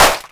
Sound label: Claps & Snares; Mutekki Media; Vengeance Electroshock Vol.2